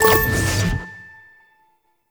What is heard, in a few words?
Click; Warframe; Duviri; Hack Minigame